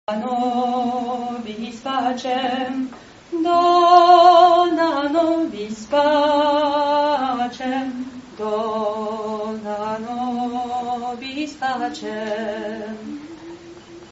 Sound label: alto; agnus dei